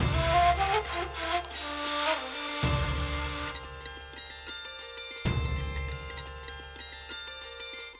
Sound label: Win; GlobalGameJam 2021